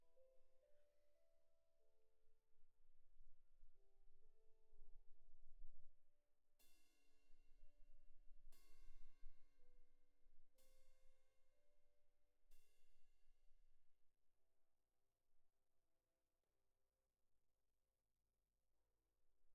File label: Cymb2 Main